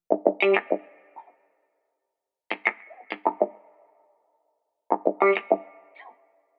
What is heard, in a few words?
stac gtr bar 4